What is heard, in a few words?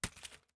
Paper Bin Toss